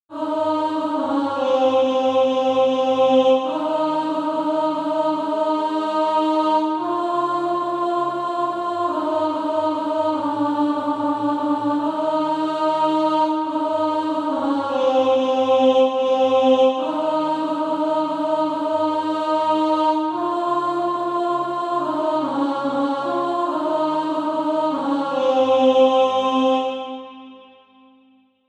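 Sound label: alto, Sheet music (SATB) including Practise Music; Dare to Be a Daniel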